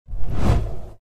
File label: flap; BGM; infinitefusion